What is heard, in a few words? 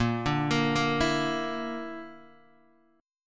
Bb Major Flat 5th, Guitar, DADGAD; x,1,0,3,1,2